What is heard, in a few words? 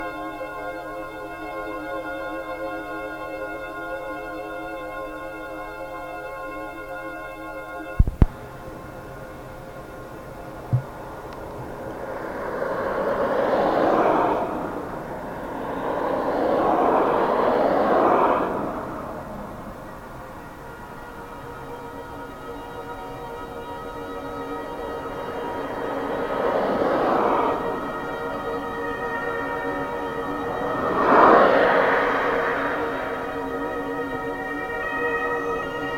ambulance